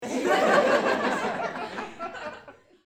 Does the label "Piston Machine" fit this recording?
No